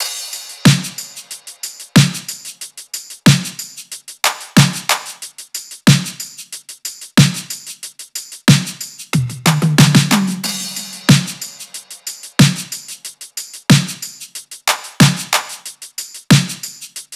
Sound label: top 92, Drum Loops; SD MIDNIGHT Sample Pack; Slate